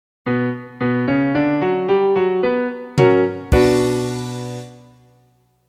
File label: Establish B Minor; McGraw-Hill